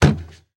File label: Hand Grab Wooden Banister; Foley